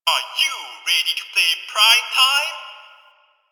Gambling Machine Bark; Warframe; Ambience; Nineteen Ninety Nine

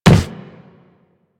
Walk Wood, Footsteps; Warframe; Operator

